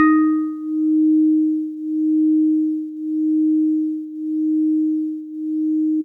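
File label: Jazzy Organ DX100 D#5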